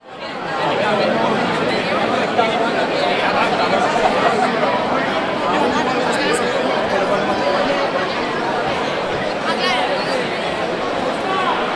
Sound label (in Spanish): calle somera - ruido ambiente; bilbao; soundfood